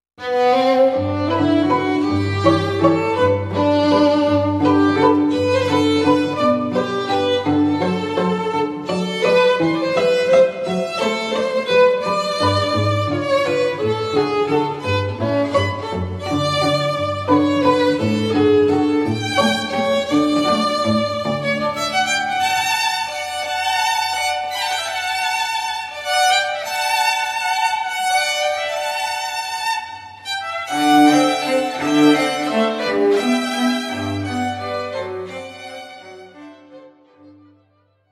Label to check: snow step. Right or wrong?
Wrong